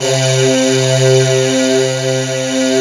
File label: GIRL SH, FAIRLY VOX; Synth